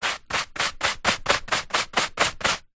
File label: household brush small hand held sweeping sofa; zapsplat